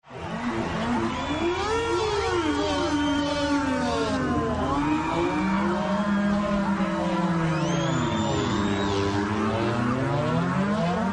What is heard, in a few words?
G Minor Adagio